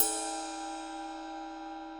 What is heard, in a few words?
22 inch A custom ping ride ping, cymbal; Zildjian